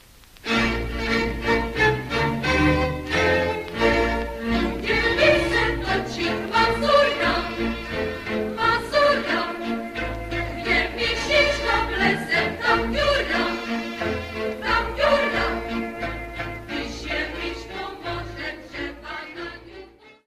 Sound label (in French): Mazur, Pologne